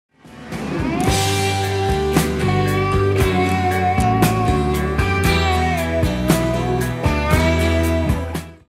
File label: Guitar